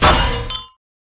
Metal debris

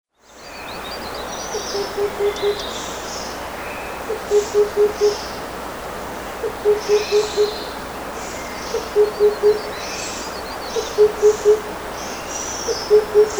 Himalayan cuckoo; پرنده کوکوی هیمالیایی

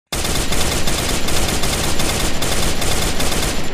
FAMAS; اسلحه

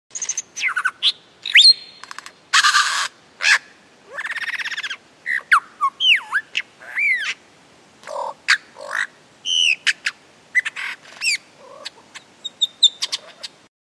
lyrebird

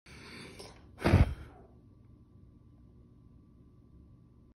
blow out candle; شمع فوت کردن

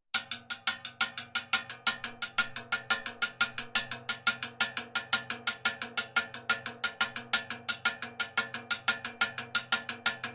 timbale

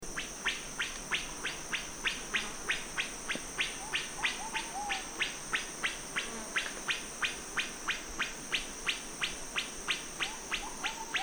Black curassow; پرنده کاکل فری سیاه

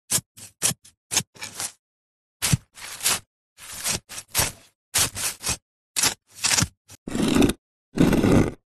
garden trowel

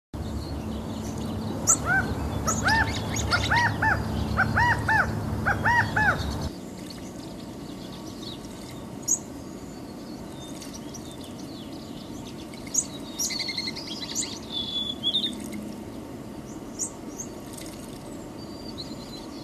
california quail sound

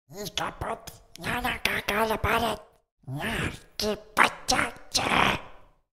Goblin

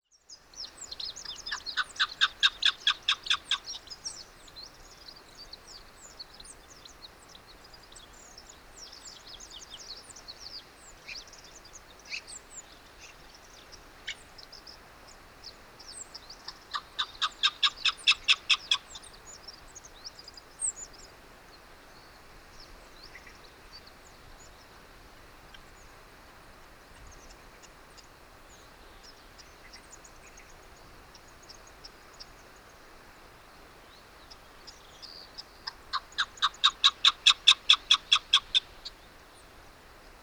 Chukar; کبک چوکار

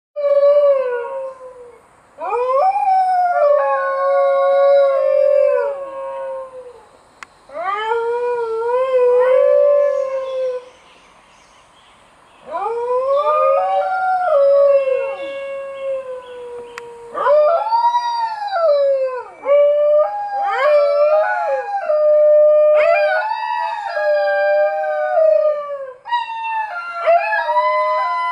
Dingo; دینگو

